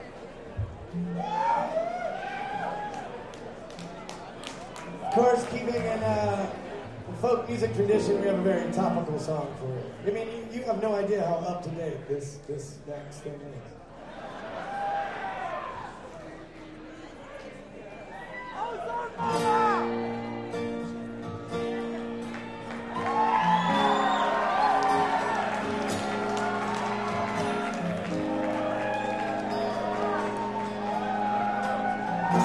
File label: Thunderstorm